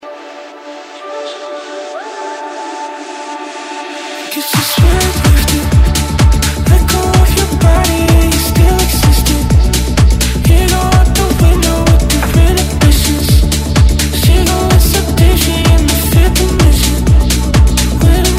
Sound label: progressive eletronic music